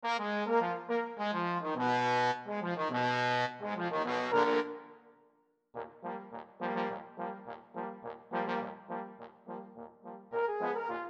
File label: Low Brass Quartet